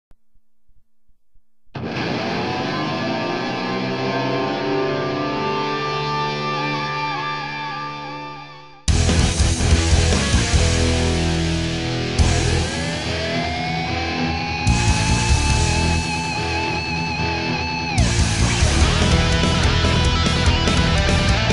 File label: Intro Music